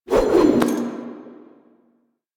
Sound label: Speargun Thrown Reload Whoosh; Warframe